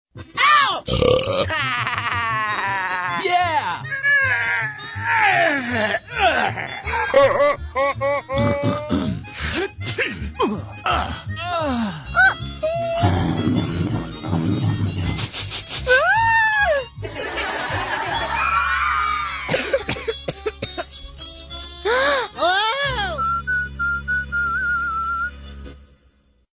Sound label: walla; vtoons; demo